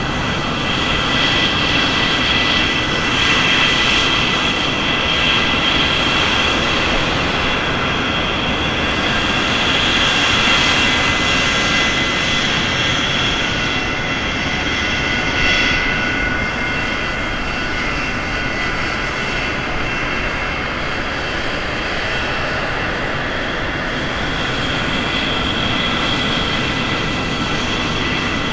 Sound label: jet engine medium; Call of Duty Modern Warfare